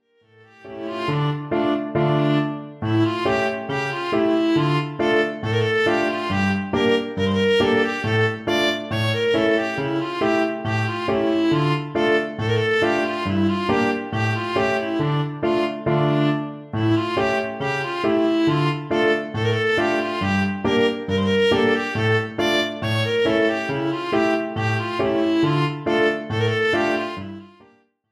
gavotte vla kl

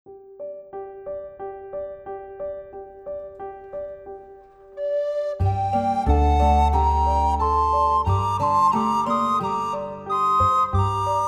bourree

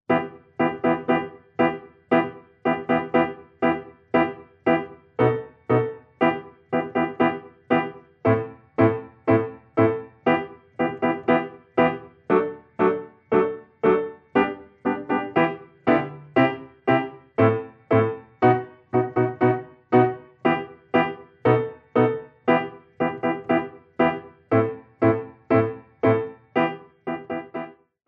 Tango

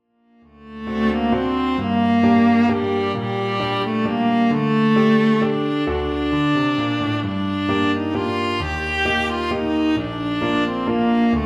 waltz vla kl

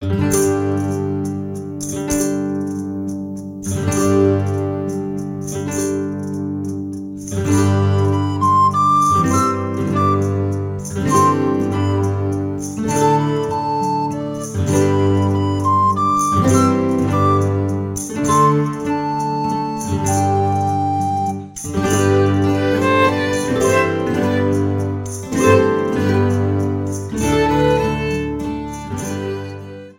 Menuet

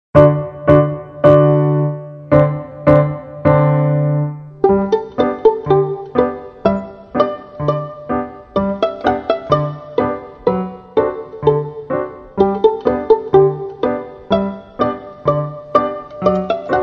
playing pizzicato

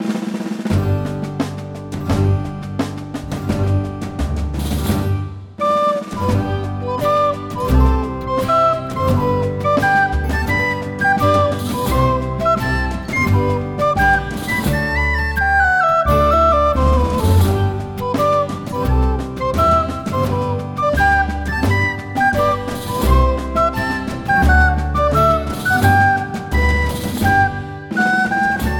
hornpipe

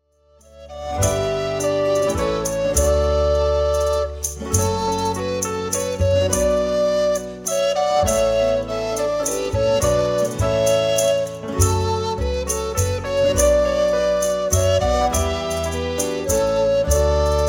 Bourree; de goure faraud

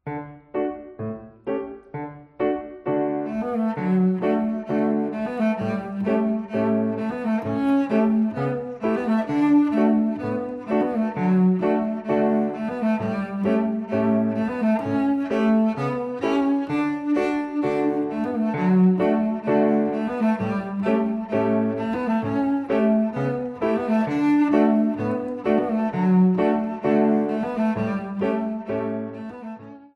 polka

